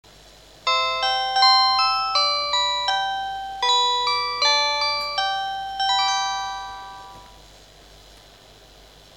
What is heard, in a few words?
chime; hokkaido